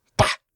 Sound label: Little Attack